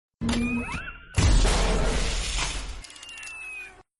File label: repulsor; iron man